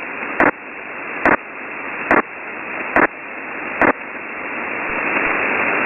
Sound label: Frequency hopper